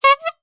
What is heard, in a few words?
Cartoon Horn Small Hand Horn Honking; AdobeCC